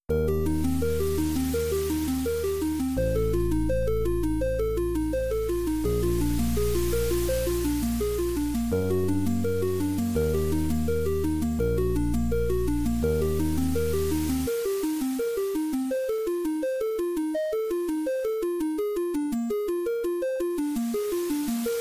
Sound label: 8-Bit